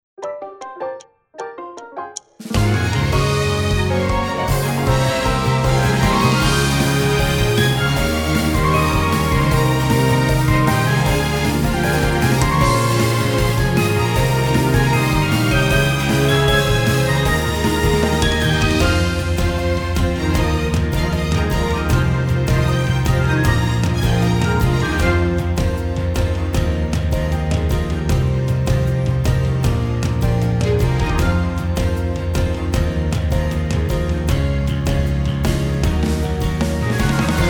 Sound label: Off Vocal